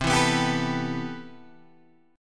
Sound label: C Major 13th, Guitar Open G, chord